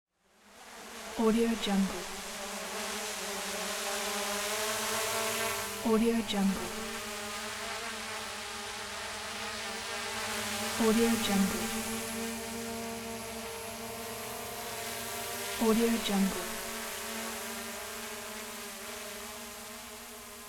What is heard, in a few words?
small drone flying hovering; transitions and movement; پهپاد کوچک